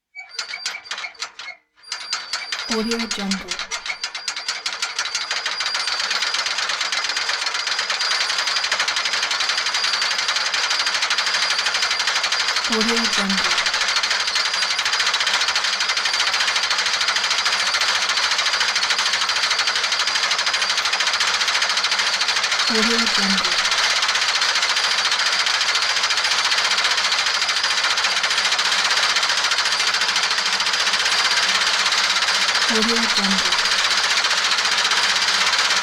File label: mole drill gears